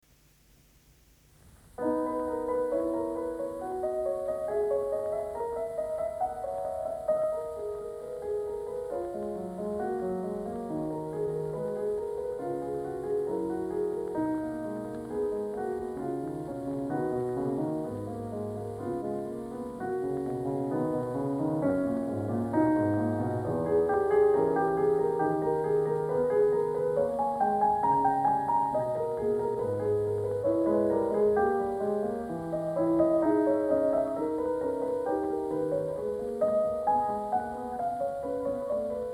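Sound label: Prelude in B, piano; Bach; WTC, Book 1; Prelude No. 23; BWV 868; FISCHER, Edwin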